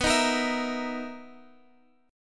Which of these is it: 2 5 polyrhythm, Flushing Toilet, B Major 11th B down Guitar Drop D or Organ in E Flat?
B Major 11th B down Guitar Drop D